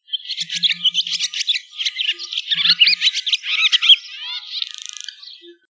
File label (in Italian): rondine; test riconoscimento versi animali